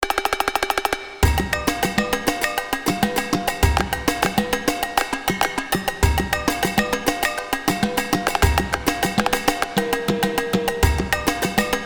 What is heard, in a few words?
Tin Toms